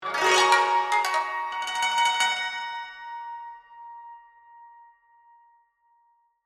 Accent Oriental, Music, Accent, Oriental, Tranquil; Musical Sound Design; Blast Wave